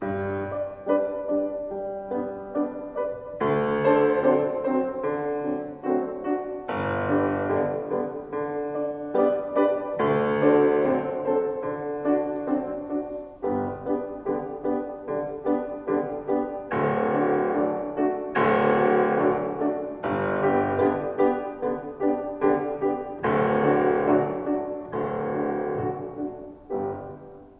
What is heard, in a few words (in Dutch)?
begeleiding piano; eine kleine nachtmusik; improviseer een nieuwe melodie